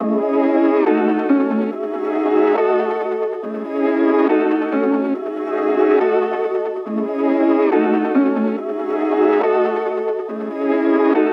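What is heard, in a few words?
Trap Synth Melody Loop 140BPM C minor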